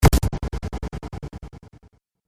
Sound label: Trailer Hit Vibrating Distortion, Trailer Sound Effects